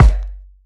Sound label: Kik; Rawcutz - Nu Forms